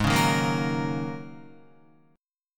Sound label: G sharp 7th Suspended 2nd Suspended 4th, Guitar; G#7sus2sus4 strummed; 3,4,3,3,6,6; JGuitar